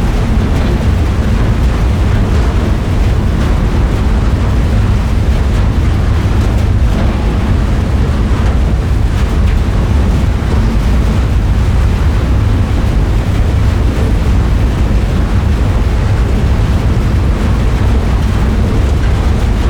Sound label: Harvester Rails Loop